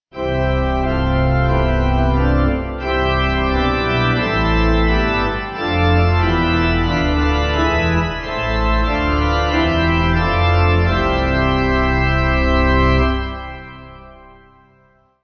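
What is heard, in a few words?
Acclam Pipe, Pipe Organ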